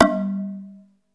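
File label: PERC 2 G2, percussion; SEQ AND PERC; Music Store - Claudius Diemeter Schwerlos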